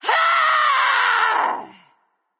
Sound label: battlecry; daji